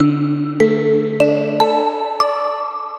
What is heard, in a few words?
signal mono; 32KHz; 16bit; phone; prenosimost